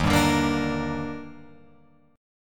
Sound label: Eb Major 7th, Guitar, Open F; EbM7; strummed; JGuitar